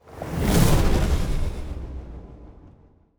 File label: transport ship move; Stellaris; aquatics; ships